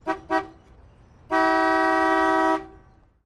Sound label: Car Horns